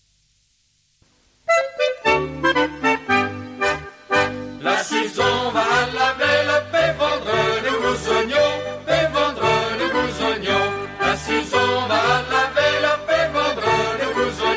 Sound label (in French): orchestre